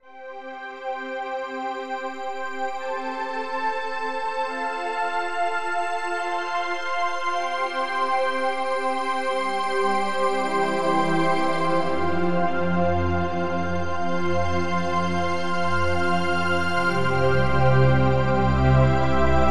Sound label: Glass Pad; KORG OASYS